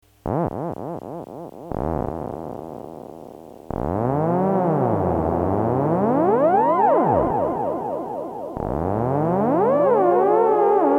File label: theremin delayed